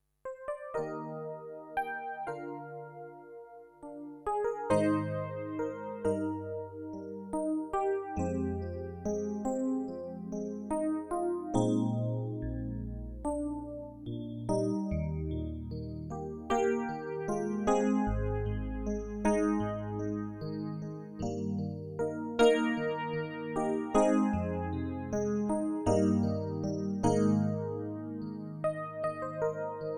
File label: Yamaha P105 EPiano